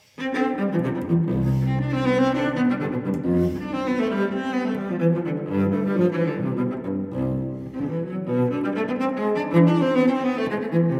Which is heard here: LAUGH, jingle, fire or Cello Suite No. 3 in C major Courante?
Cello Suite No. 3 in C major Courante